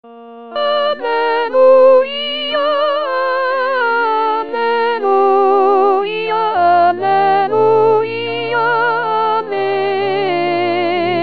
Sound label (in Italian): alleluia soprano; gabrieli